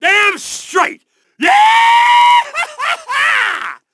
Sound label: Hahaha